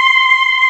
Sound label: CHOIR C5; Big Fish Audio; Synth City